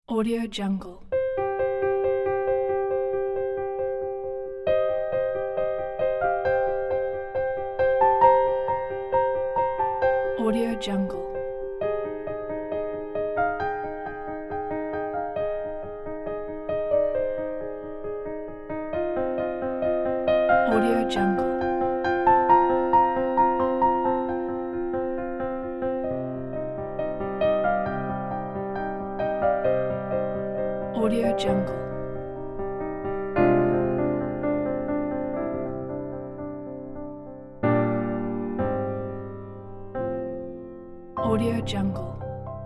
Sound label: beautiful piano logo intro